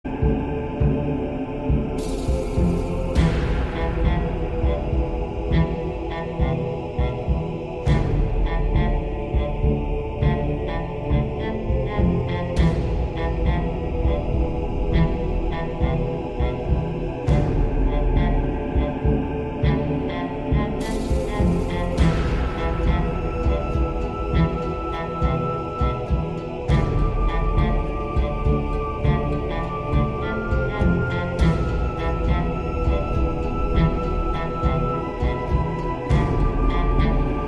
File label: Creepy Egyptian Music